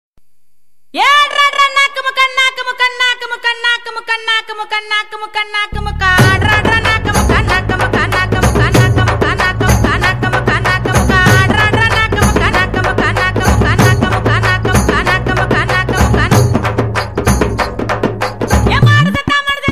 mulher arabe cantando

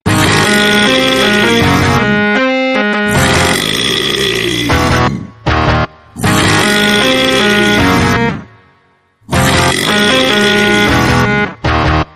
sons de arroto; sons de arroto e rock

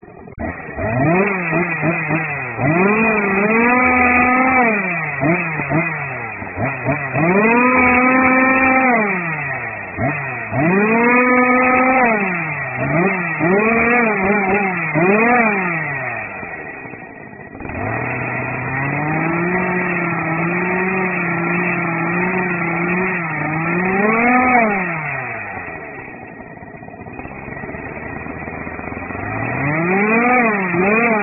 som de motoserra

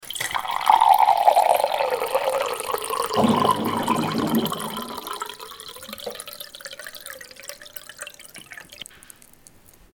enchendo copo

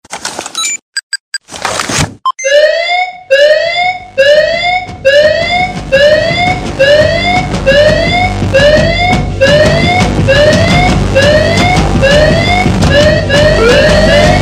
musica de alerta policial

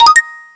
moedas efeito sonoro

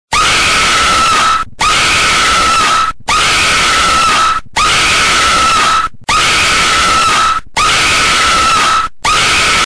Grito, toque do grito assustador

